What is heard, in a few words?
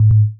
failure; lila